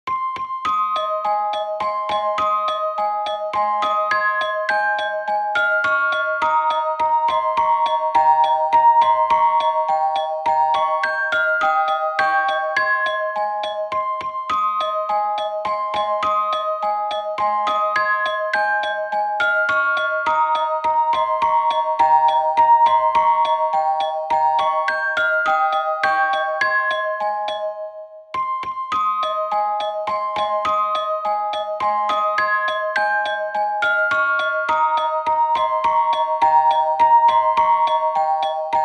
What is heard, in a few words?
Ice Lullaby